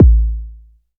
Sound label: kick, Novation Bass Station 2